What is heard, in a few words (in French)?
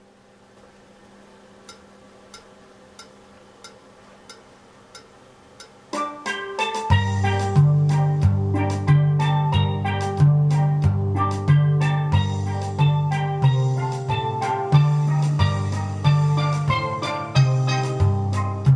basse theme + accompagnement; BELLA CAO